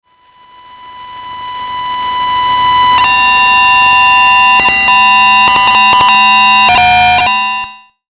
Electronic Static Crescendo High Hum Digital Stutter; Production Element Imaging Element Accent Transition